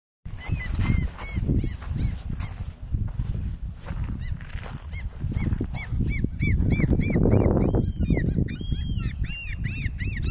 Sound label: seagull